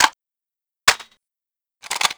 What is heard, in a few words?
lmg world reload; tf2classic